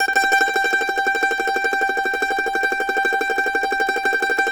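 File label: mandolin tremolo G5; Sampleheads; Whole Lotta Country 1 & 2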